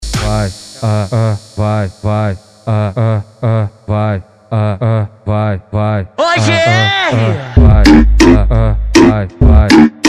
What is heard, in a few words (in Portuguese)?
sound car funk; street